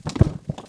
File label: crumble